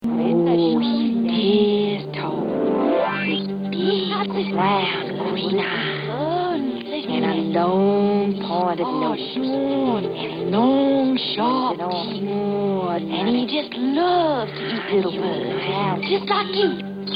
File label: phaser